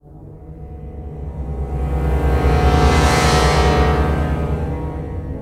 Mystery Suspense

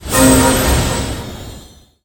LEVEL UP APPLIED

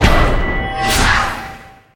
UI PLAYER JOIN; Dungeon Defenders Eternity

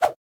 Spawnin Whoosh; boomerang fu

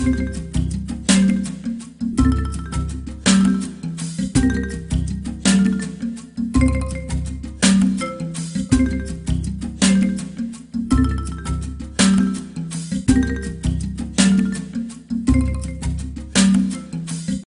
Jungle Level

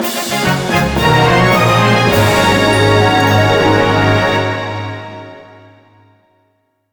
Win Fanfare; Yu-Gi-Oh! The Falsebound Kingdom

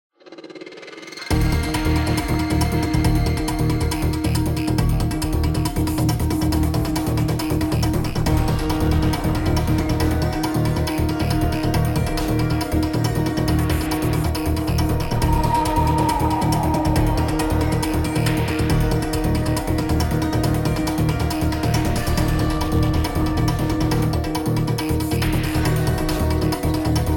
Tic-toc Clock Run; Sniper Ghost Warrior 3 2017

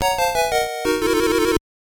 Jingle

